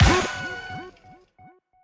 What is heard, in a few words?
Shot F#; Popslinger